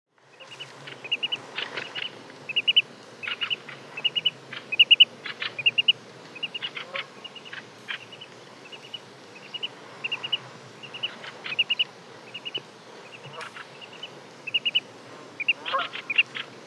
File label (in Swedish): myrsnappa, fagelljud